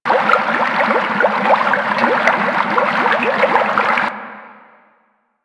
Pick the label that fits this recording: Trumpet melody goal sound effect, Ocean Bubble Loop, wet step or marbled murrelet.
Ocean Bubble Loop